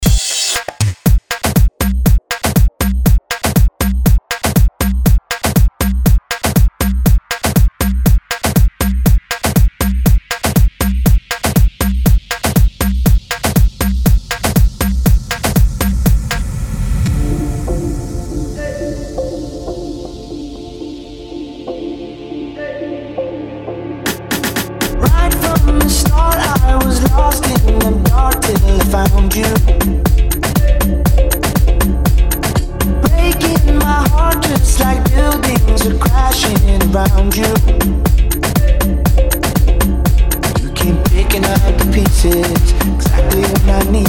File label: Top 40; Redrum